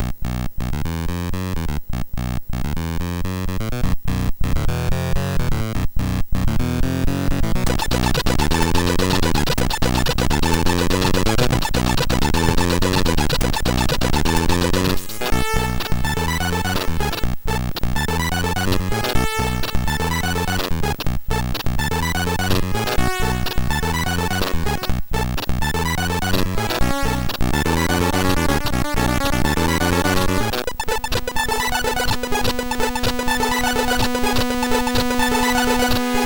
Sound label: Amiga remix, sid MOS6581R4; Sly Spy Tune 2